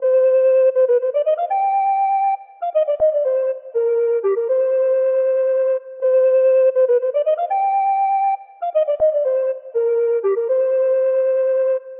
song 80bpm

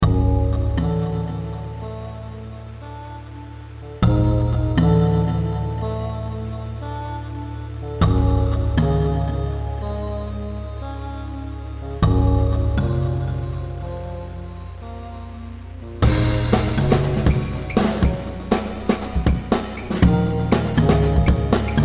Paper Bag Type Beat

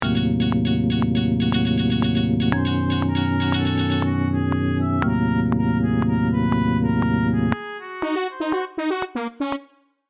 electoral music

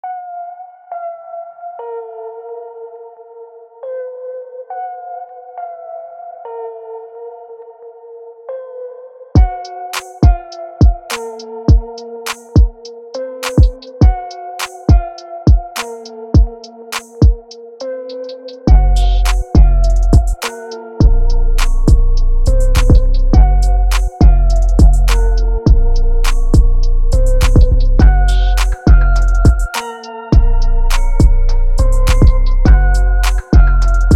west coast vibe

